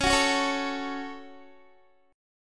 C sharp Suspended 4th Sharp 5th C sharp down Guitar; strummed